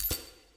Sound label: ui button info click; tft lny2022